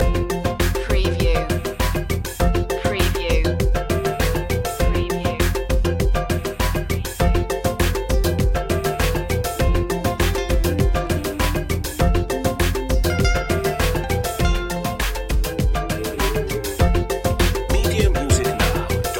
popcorn loop

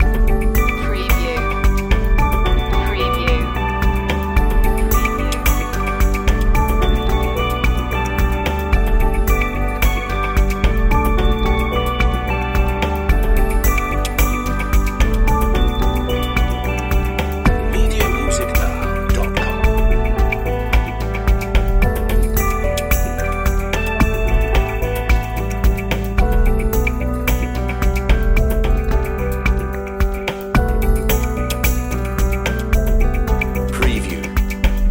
footprints in the snow loop

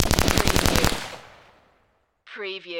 Machine Gun Colt AR-15 Long Burst Close Range

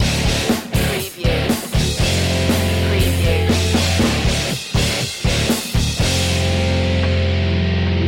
metal loop

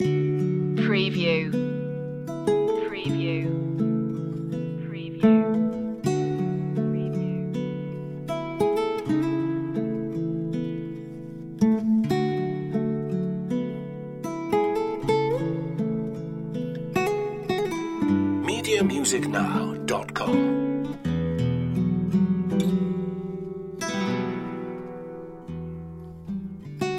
a quiet heart loop

